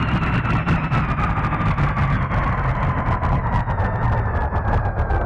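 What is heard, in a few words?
engine decel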